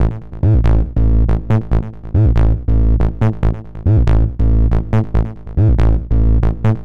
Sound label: HARD BASS 140 A, Hard Trance, Bass